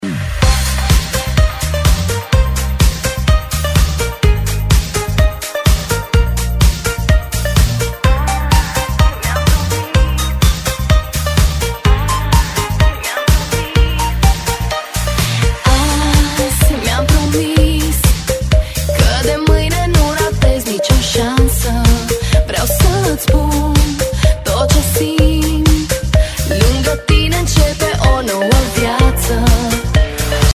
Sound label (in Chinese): 法国慢摇 劲爆节奏